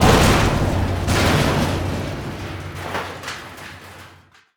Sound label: explosive objects; World of Tanks